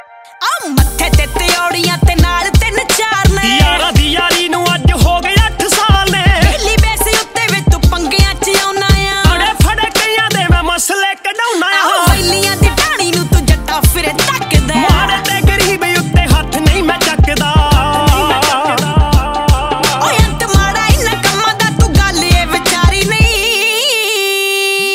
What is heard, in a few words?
Punjabi Ringtone